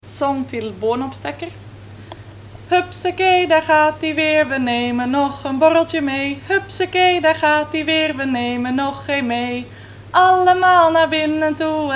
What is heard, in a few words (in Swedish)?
sang